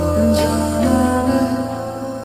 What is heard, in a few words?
Slowed reverb